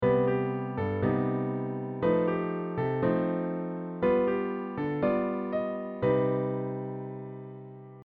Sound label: C Mm Phrase